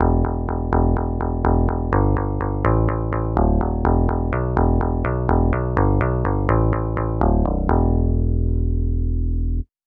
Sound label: jazz guitar bass